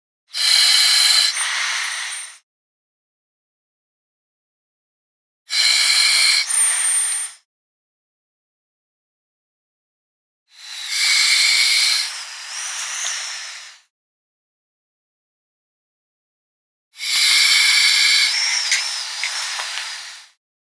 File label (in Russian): Dyhatelnaya maska